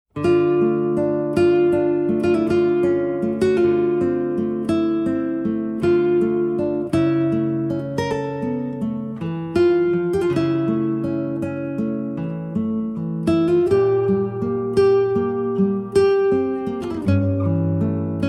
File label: Solitary For Guitar